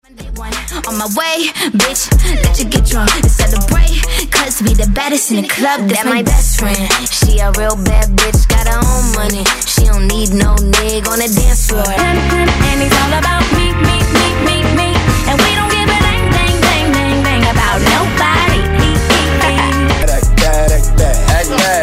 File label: Hip Hop Pop Megamix